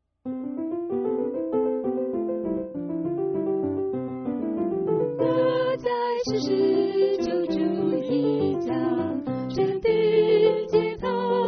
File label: Alto